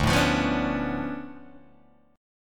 D Minor Major 13th, down, Guitar, DADGAD

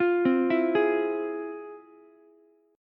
F Minor Major 7th, Ukulele, chord; FmM7 arpeggiated; JGuitar

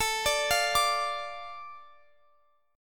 A Suspended 4th Sharp 5th, Guitar Open F; Asus4#5; arpeggiated; x,x,21,21,20,21

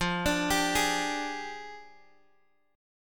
F Minor 6th Add 9th, Guitar, Open G; x,10,12,12,9,x; down; chord shape

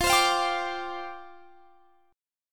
C Suspended 2nd Suspended 4th, F

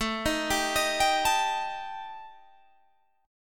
D sharp Major Flat 5th, A

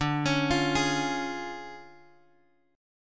D Minor Major 7th Flat 5th, D; DmM7b5; {X 5 X 6 6 4} arpeggiated

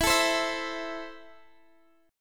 E Suspended 4th Sharp 5th, Guitar Open G; x,x,14,14,13,14; down

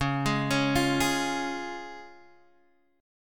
Db Major Db down Guitar Half Step Down

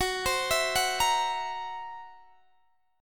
Gb 7th Flat 5th Gb Guitar Standard; arpeggiated; JGuitar

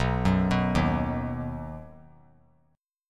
B Major, 3C,3F,3A,3D; arpeggiated; 11,13,14,13; JGuitar

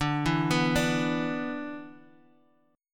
B-Minor Double Flat 5th

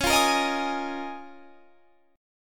C sharp 6th Add 9th C sharp down; C#6add9; strummed

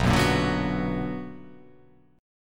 B Minor Major 7th

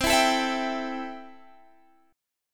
C 6th, C, down, Guitar, Standard; strummed; capo 9

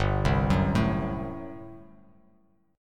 G Major 7th Suspended 2nd Suspended 4th G down; GM7sus2sus4 arpeggiated

